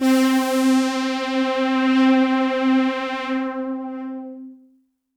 Synth C, Synth Prophet One Shots; Electronisounds

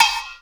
METAL; Crypton; DrumBase5000

